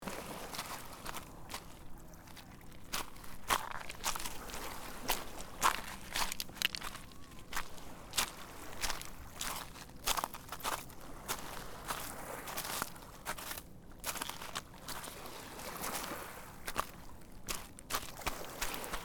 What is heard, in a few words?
walking on stone beach